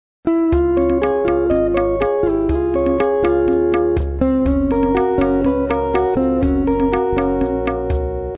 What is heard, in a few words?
menu music